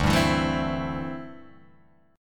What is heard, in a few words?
D 7th Suspended 2nd Suspended 4th, Guitar Open G